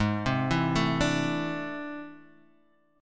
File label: A Minor Major 11th